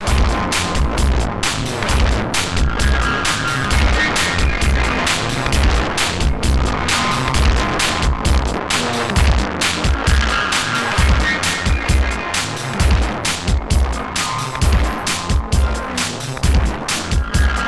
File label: Erica Fusion Plasma Drive Track EQ Mix